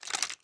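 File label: Inspect Movement; Valorant; frenzy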